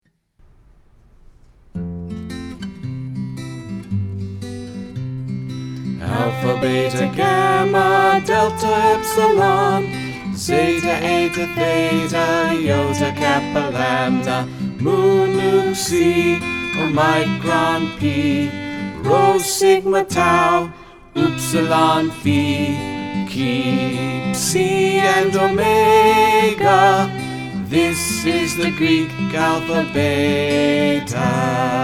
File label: Greek Alphabet Song